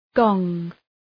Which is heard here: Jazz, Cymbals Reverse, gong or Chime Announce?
gong